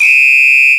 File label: Yamaha EX5, Drums